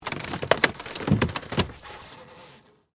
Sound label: Impact Wood Stress, Bend, Crack; Materials; BlastWave